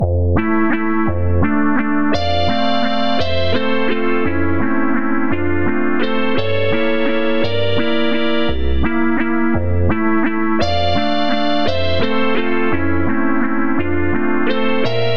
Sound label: Waltz alternate